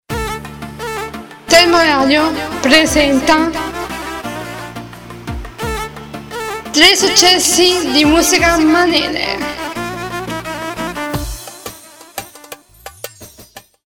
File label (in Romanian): manele, jingle; successi